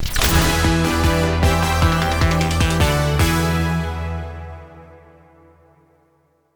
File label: Win; Galaxy of Pen & Paper; Byte Bat